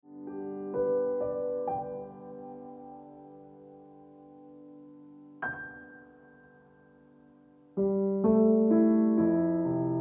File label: Early Sunshine Ringtone Piano Music